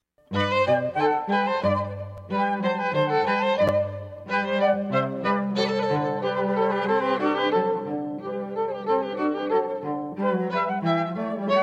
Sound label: Vivace (Flute Trio); Quantz; Cantilena South Florida